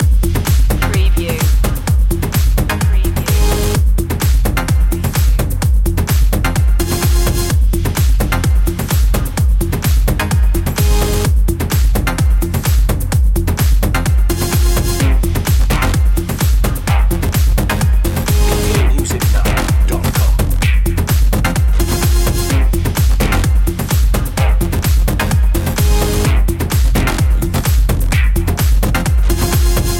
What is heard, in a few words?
tribal drops loop